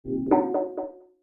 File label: user online; bitrix